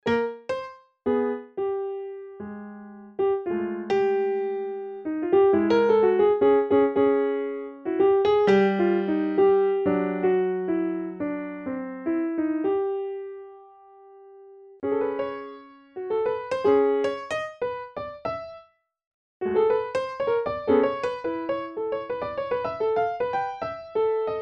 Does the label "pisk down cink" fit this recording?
No